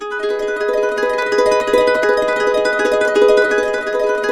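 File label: G# minor, Harps; Complete Harps; USB Soundscan vol.62; AKAI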